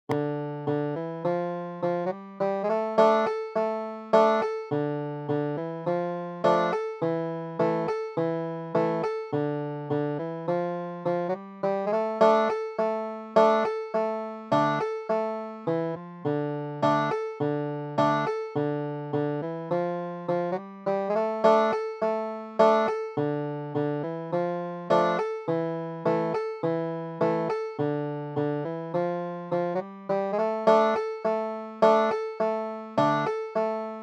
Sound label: bryson city blues, banjo